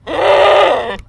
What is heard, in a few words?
zombie